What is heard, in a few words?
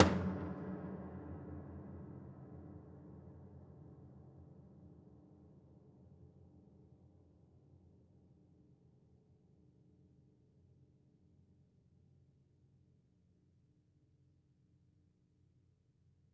Body Thump Ped, Piano